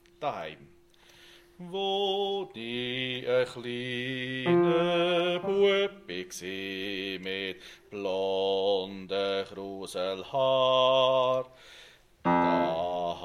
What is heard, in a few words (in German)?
Bass